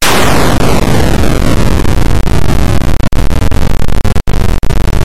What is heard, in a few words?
crash, lander; Lunar Lander